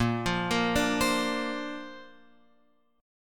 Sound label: Bb Major Add 9th, Guitar, Open F; x,5,5,5,5,7; arpeggiated